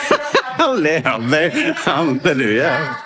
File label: haha haha hahalelujah